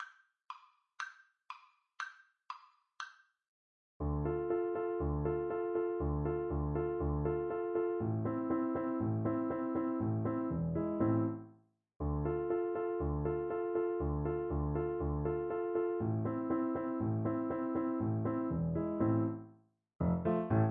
march, recorder

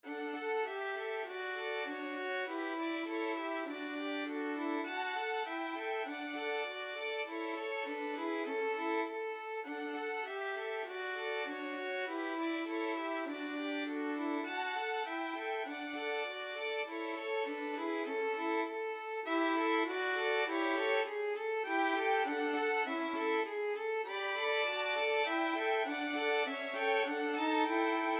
melody violin trio; schumann

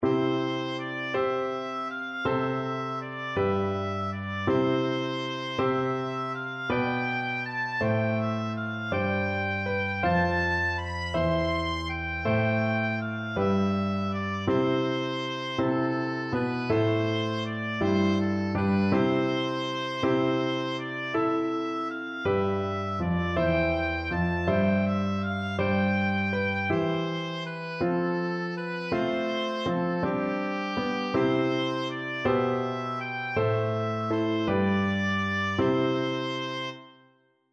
begotten, oboe

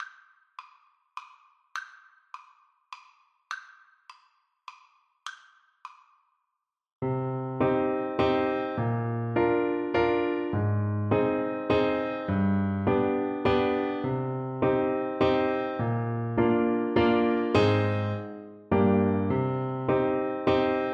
marchoise limousine, clarinet

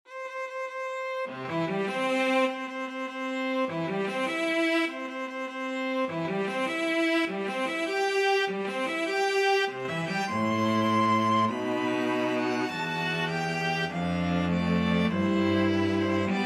wedding march, string trio

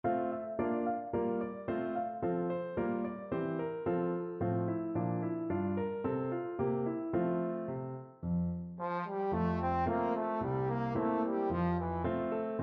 a policemans lot, trombone

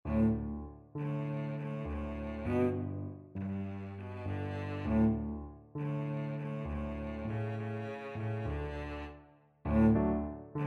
cello

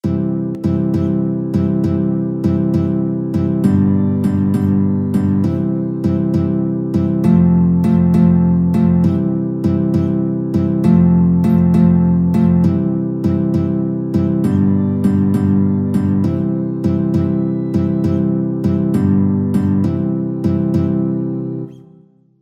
silent night, guitar clarinet duet

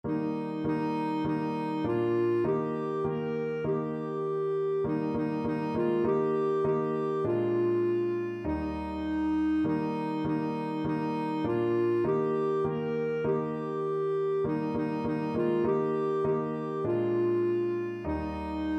blood of jesus, clarinet

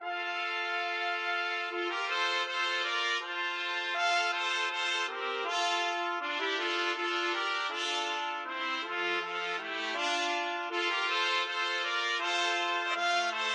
slavonic trumpet trio

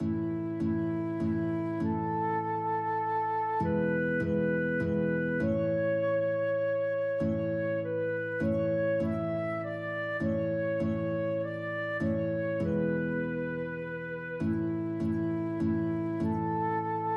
flute guitar duet; come holy ghost

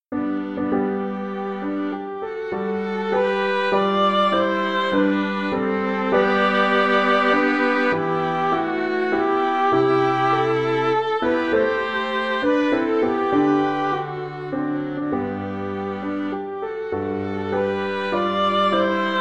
nocturne, piano quartet; mendelssohn

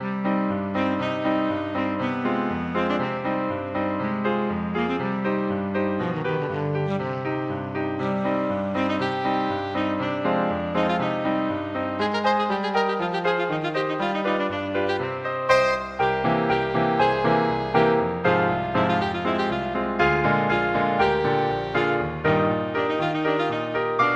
hungarian dance, tenor saxophone

